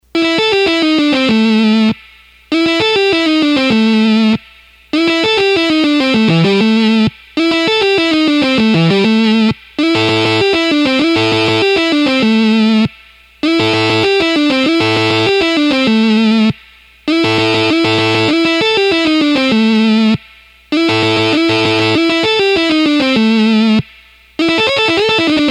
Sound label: Rock & Blues Licks Fast